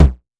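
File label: CLUB low tom, CLUB KIT; Wizoo - Platinum 24 Electronic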